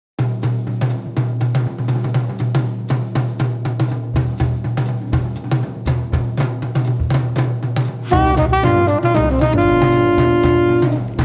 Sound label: Swing Jazz vocal